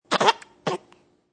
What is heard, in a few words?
Baby Sound; Babies